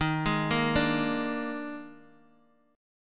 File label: Eb Major 7th Suspended 4th, Guitar, DADGAD